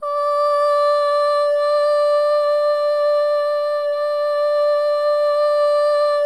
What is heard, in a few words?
solo boy; Spectrasonics; Symphony of Voices